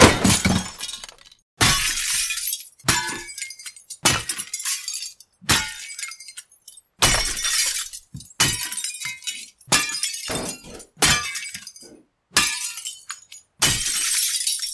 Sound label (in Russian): zvuki razbitogo stekla